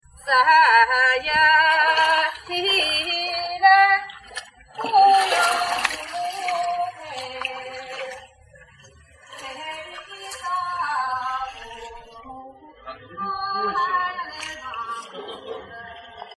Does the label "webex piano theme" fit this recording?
No